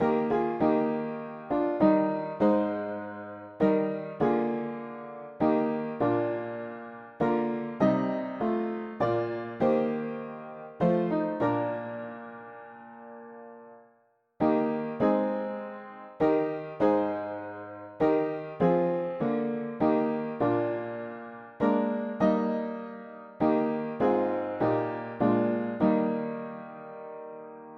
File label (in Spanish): mono piano; hymns; Himnario pequeno